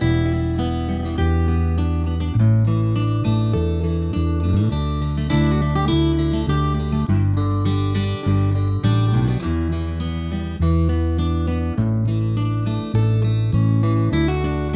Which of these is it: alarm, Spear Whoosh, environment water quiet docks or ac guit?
ac guit